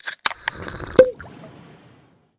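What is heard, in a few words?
beer pot-bellied pleasant sound of opening a bottle cork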